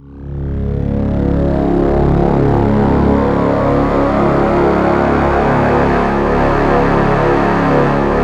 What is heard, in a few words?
C#, Synclav FM; Big Fish Audio; SPACE2